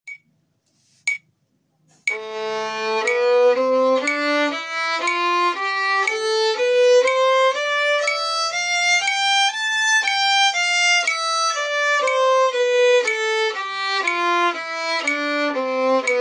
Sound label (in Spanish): Escala de la menor en negras y corcheas, Escala de la menor con metronomo